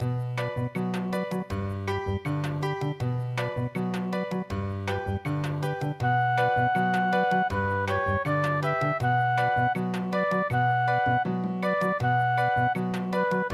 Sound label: swan lake bossanova